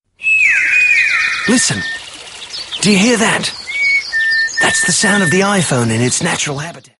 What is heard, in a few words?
nature